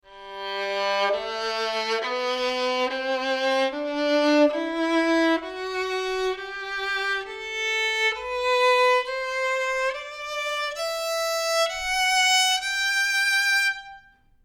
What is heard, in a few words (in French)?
son Violon; Corde